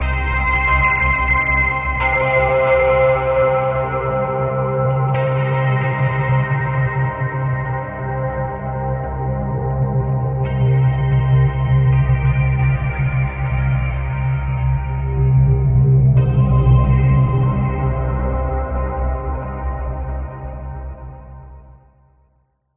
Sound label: guitar end; Shadows of the Damned